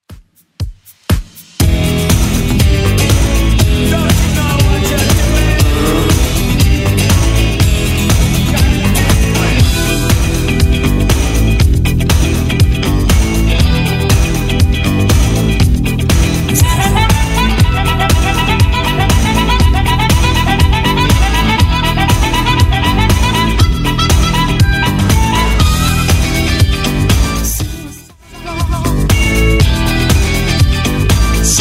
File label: 80s Redrum 120bpm